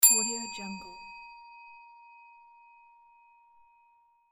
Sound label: call bell